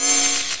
awp zoom, weapons; svencoop

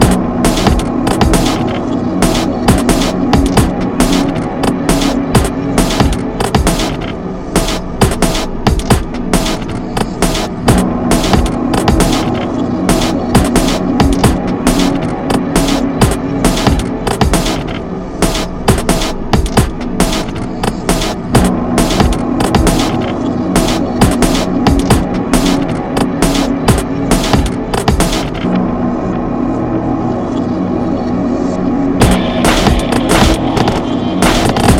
boss fight loop, music; svencoop; morass beta

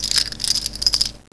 m60 belt out, weapons; firearms

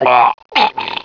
h2o death; dmc

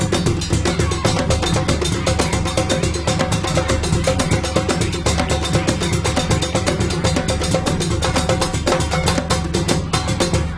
music

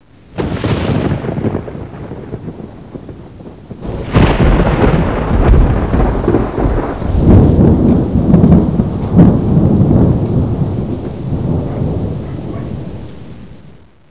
thunder, ambience

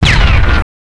carpet bomb; gearbox; manta

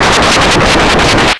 brainshock

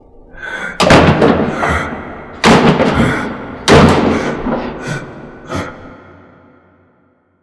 scream; svencoop

